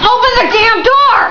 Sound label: open door; Open the door!; SPECIES